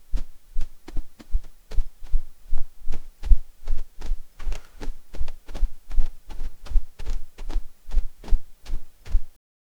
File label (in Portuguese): som de armadura de cavale, armor